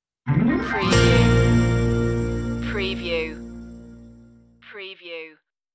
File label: stinger